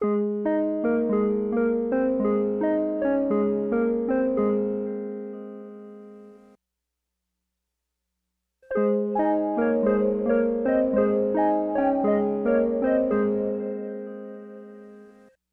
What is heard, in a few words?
Forest Bells; bugsnax